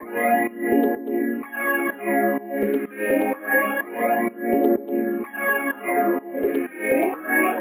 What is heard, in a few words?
126 A FX; CruelOath; MELO + FILTER; RoBKTA Sample Pack Supreme